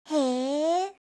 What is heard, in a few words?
hee; reaction